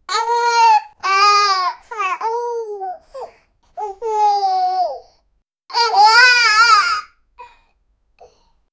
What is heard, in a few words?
a cute baby boy around, amazement